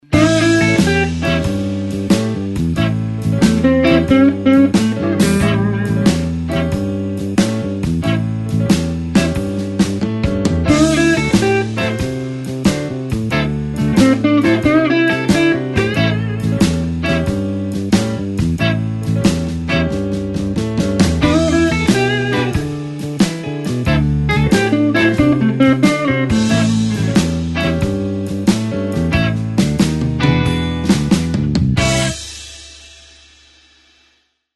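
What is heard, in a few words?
blues shuffle solo A call response with feel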